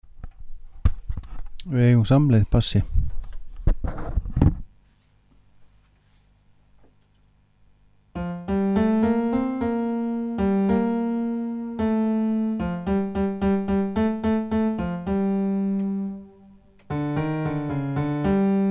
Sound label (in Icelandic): bassi